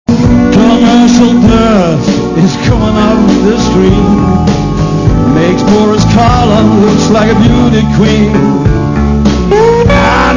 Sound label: tv blues